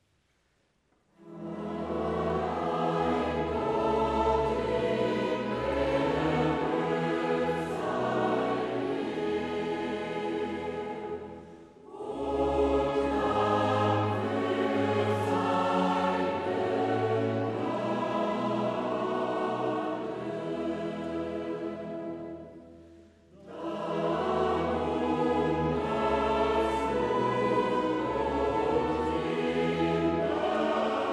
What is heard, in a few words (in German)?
Choral Allein sei Gott; Mendelssohn